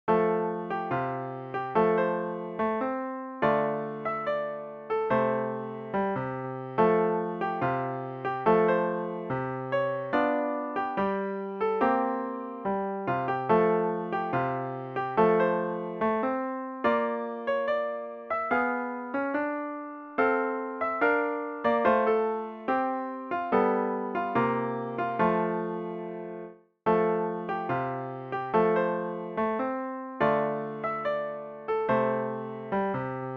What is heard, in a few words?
irish lullaby piano solo